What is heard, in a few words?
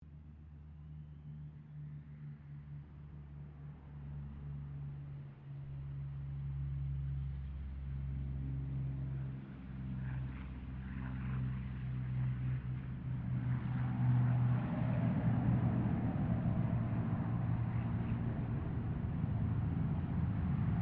AIRCRAFT FA-18 HORNET TAKEOFF STEREO; RAAF FA-18 Hornet; Airplane; Vehicles; Aircraft Collection